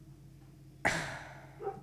Haaahhh bark; random and out of place

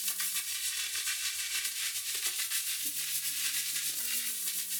Cracklature, sparse soundscape

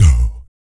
BIG BREATH; ILIO; Vocal Planet VOL-2; Blues & Soul

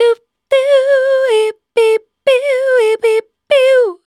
VOC F, Dance Vocals, FEMALE

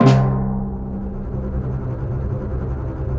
HIT, HITS

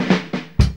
FILL 118, Funky Elements loops; Zero-G

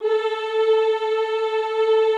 A3, Classical Choir; Best Service; Extended Classical Choir

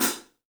3D Drums, TIGHT ZILDJ; 3D Sound

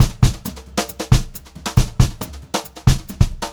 136 VARI, Brushed Drum Loops; USB Soundscan vol.39; AKAI; HOTROD

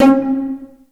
JD PIZ, STRGS

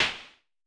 SN Low Punch, Vermona Mono15 Drums Snare

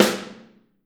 Snare OH; Snare OH All; Drums Percussion

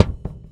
Heartbeat Loop, Kicks; Airwindows

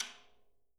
Living Drums, tom, shell; JZ XTOM 1; Sampleheads; Peter Erskine

